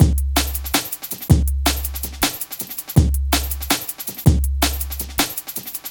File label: 162, breaks, Loops; M8